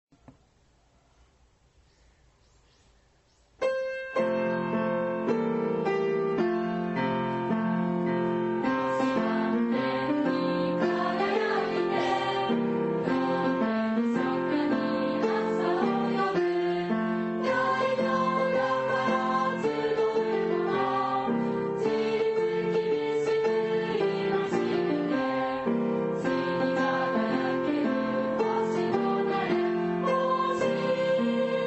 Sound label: school song